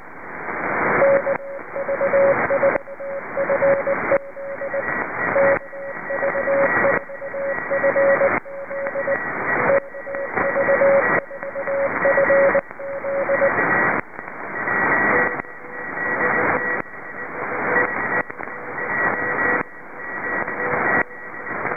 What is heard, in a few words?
Impulse Left